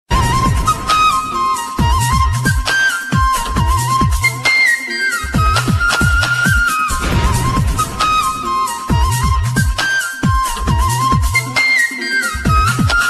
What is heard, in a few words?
Flute